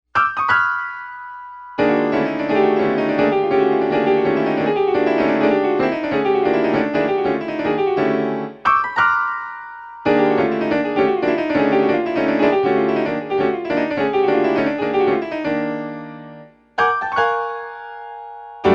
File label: piano